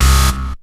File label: HARD BASS; SoundFactory; SMPMANIA